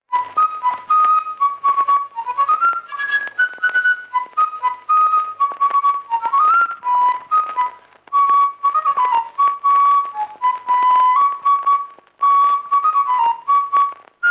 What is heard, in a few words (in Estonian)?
polka vilepill folk; Hansu; Ruuben Kesler